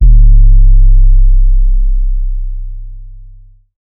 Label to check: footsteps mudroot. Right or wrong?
Wrong